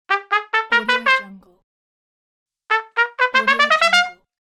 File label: trumpet short scale up; applehillstudios